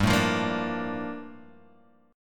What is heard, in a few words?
Bb Major 7th, G; Bbmaj7/G chord; strummed; JGuitar